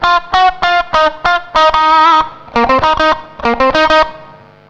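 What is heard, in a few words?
distorted guitar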